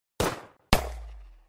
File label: 308 Bolt